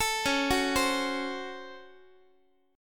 C sharp Major 7th Suspended 4th Sharp 5th C sharp Guitar; C#M7sus4#5 arpeggiated; JGuitar